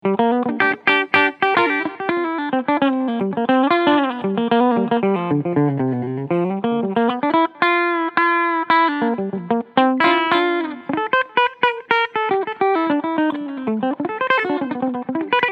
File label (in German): Cleansound Steg Pickup; Gretsch G2420T